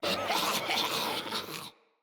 Infested Runner Attack Call

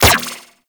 Laser Fire; Warframe; Corpus; Riot Moa Laser

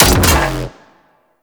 Shotgun Shot; CorpusShotgun

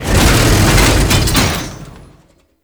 Tank Movement Stop; Enemies; Lasrian Tank; Warframe

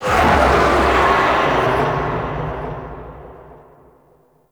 Distant Oni Vocal; Warframe; Cetus; Cetus Invasion; Ambience

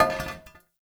Stone Cafe Table Impact; Warframe; Ambience; Nineteen Ninety Nine

